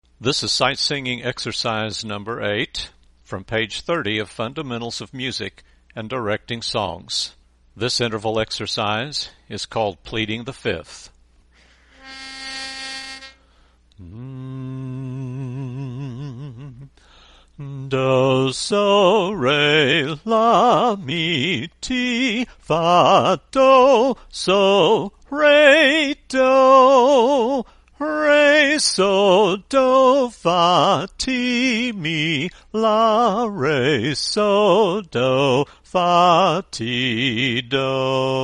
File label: Sight Singing